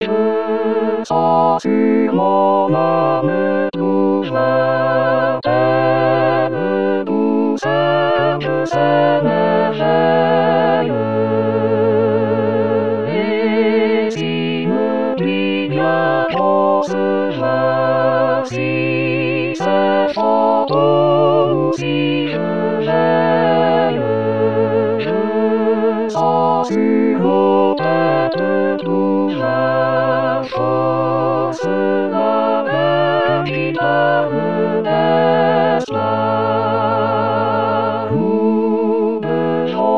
tutti; rimailleurs

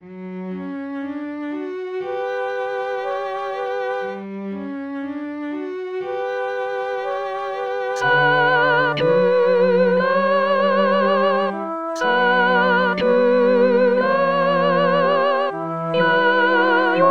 soprano

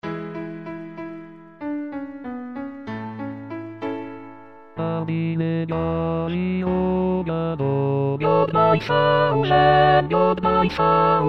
tutti; hardi; 2015 st jean

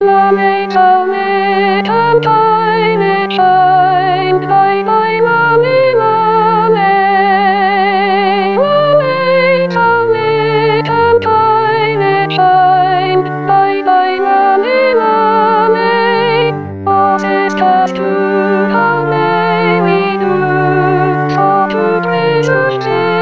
soprano; Coventry

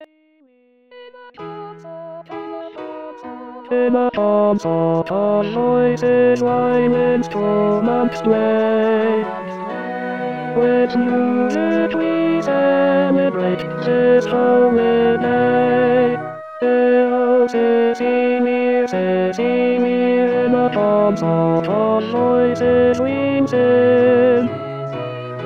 tenor; baroque

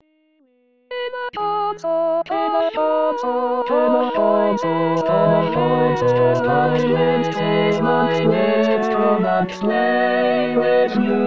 tutti; baroque

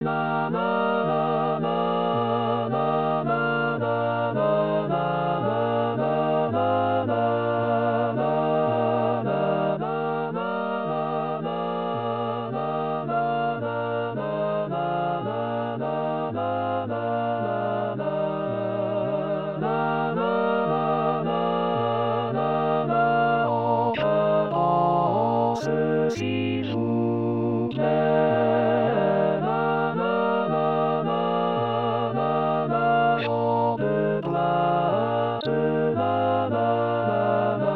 tutti; bourgeois gentilhom

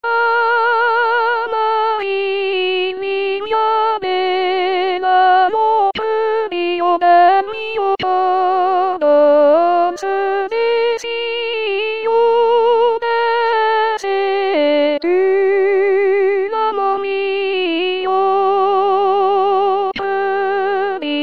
amarilli soprano

